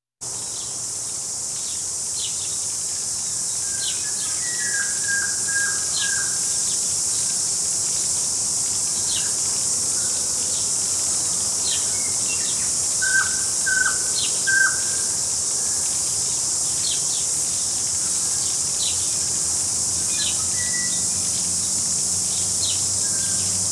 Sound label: Cicadas w Tui